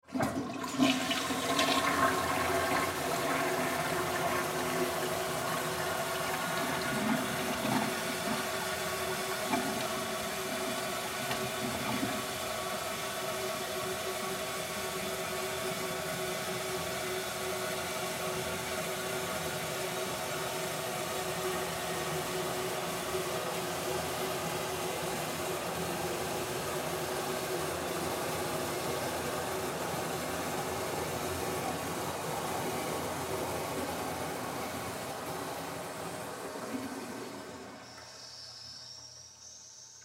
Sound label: Public Restroom Toilet Flush Long Drain; Bathroom; Office; Blastwave